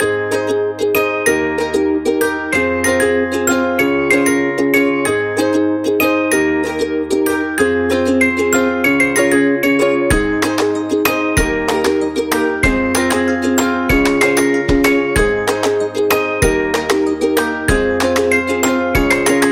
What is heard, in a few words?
Happy Song